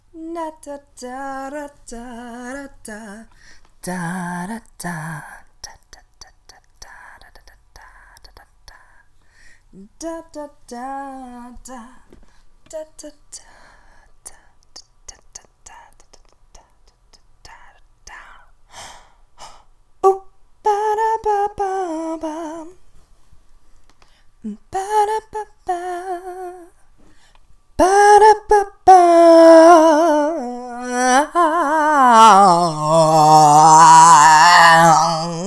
Vocalizing

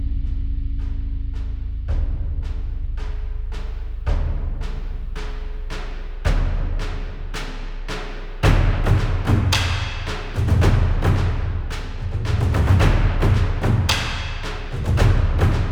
Lunar March, instrumental+choir

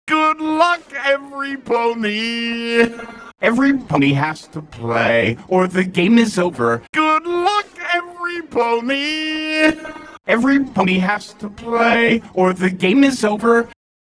Vocals, 140BPM; Pony Fan Music Remix Stems

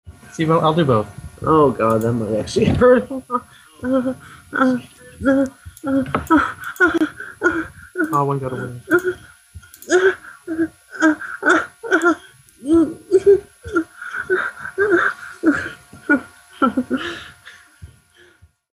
whimper

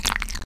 slosh; Lewd SFX

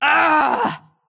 Short Scream; Lewd SFX

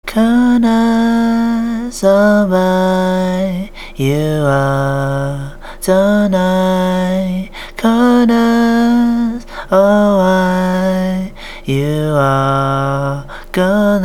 Vocal Bit

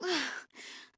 Grunt; Lewd SFX

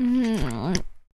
Moan

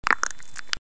Suck; Lewd SFX

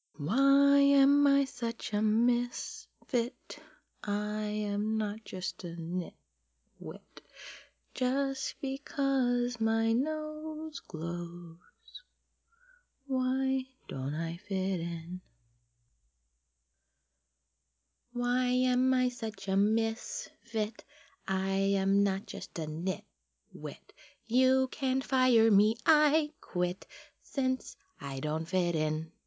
singing, cute, Christmas

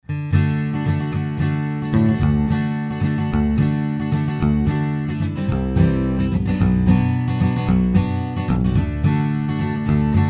One Man Band Cover

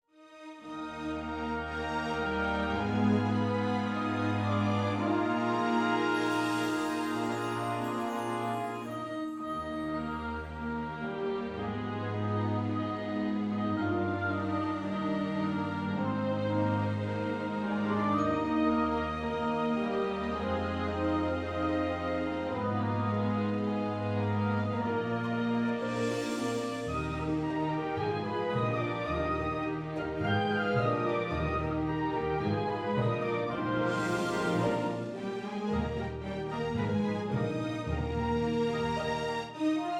Orchestral Cover

